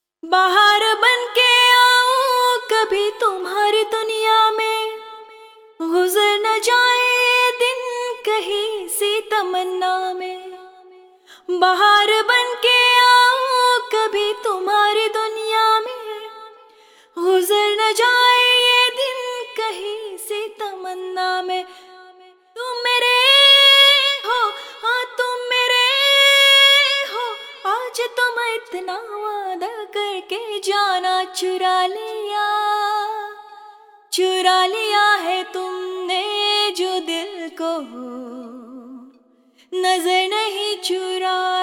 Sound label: Bollywood Vocal